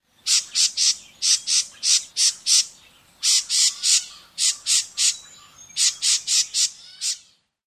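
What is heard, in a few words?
House Wren scold notes; NY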